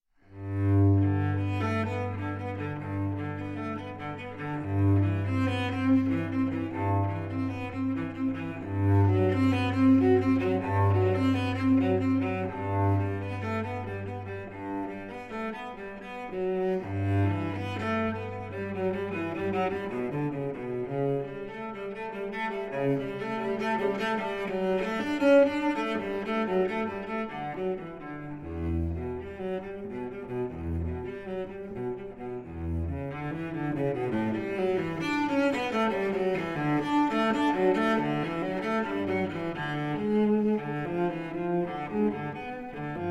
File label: Cello Suite No. 1 in G Major; BWV 1007; J.S. Bach